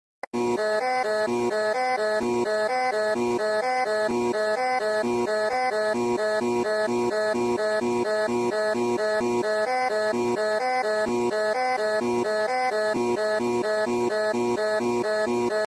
eas alarm; germany

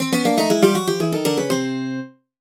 cartoon sting; twin musicom

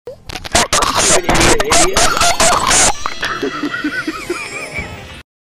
chicken noises

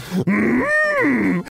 mmmmmmmmmmmmmmmmm; yoda

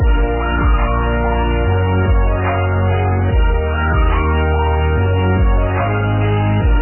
music

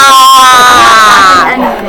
ahhhhhhh

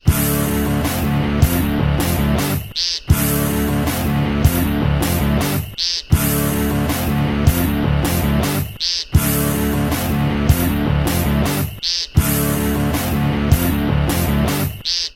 meep bird remix

